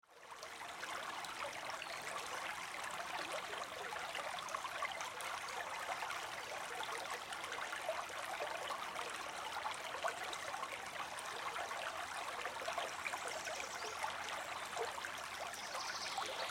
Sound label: Ambience Creek Forest; Backgrounds; Forests and Jungles